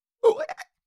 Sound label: Cartoon little monster voice hiccup